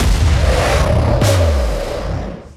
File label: Bullet Shower; starbites